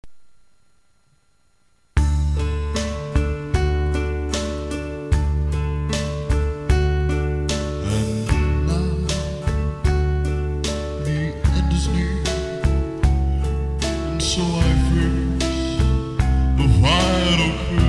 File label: Impersonator